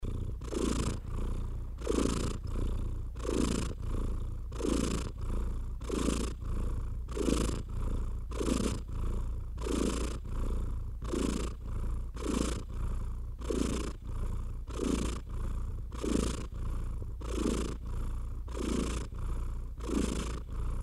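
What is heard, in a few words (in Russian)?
мурчание кота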